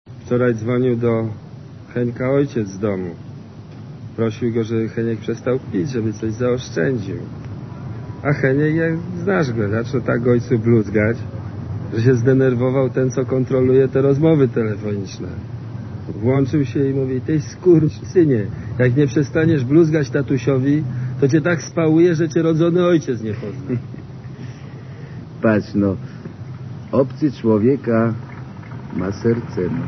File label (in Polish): telefon